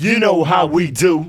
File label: voc G, Hip Hop & RnB; Samples vol.34